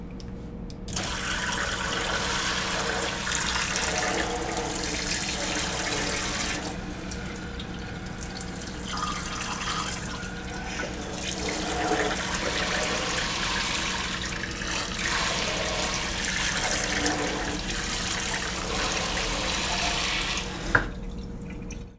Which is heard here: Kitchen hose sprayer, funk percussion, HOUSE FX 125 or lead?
Kitchen hose sprayer